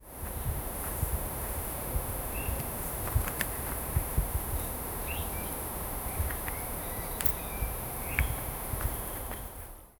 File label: in an ancient forest with